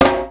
Percs

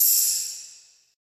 Hat, Closet Hat

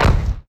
kick

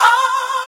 Chant, Vox

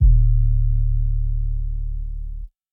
808s

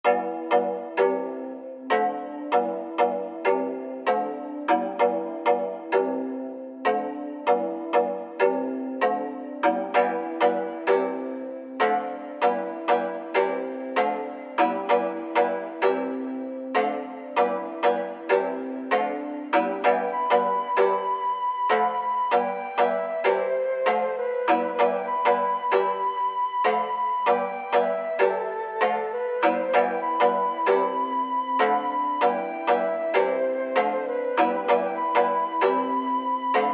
97 bpm

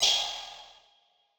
OHAT, Open Hats

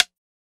Snares

